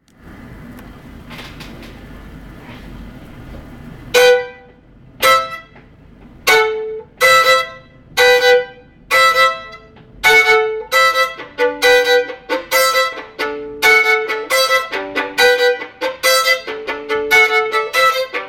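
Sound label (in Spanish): salsa con violín tocando un tumbao cubano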